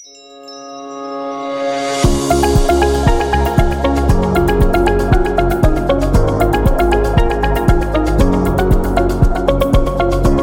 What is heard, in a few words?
Happy Corporate